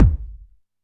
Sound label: DM5 Kick, Alesis DM5 drum machine